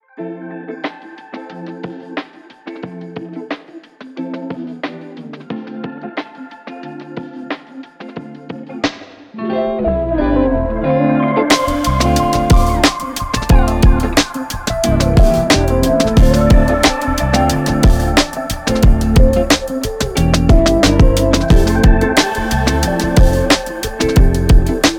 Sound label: Smoothjazz